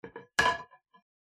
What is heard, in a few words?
DROPPING katana